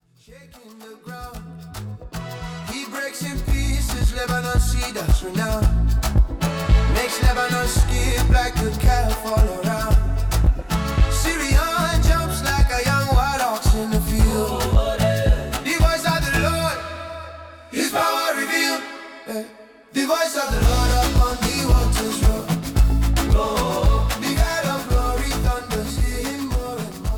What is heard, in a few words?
Afrobeats; sample